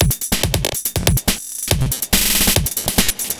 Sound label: Glitch Funk, Drum Loops